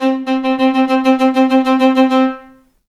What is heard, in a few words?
intro classical